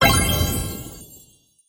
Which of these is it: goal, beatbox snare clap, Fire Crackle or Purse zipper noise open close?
goal